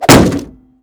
shield light bash

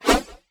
blade 1 hand bound swing

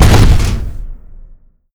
dwarvencenturion foot, walk; npc

